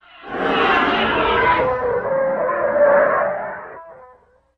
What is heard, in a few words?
Animal Jet Element, dinosaurs